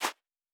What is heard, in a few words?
Footstep Sand Running